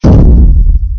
impact metal

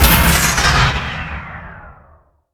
arc fire, weapons

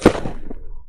impact stone

